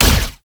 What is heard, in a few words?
Proj Fire Shot, weapons; Lizzie; Warframe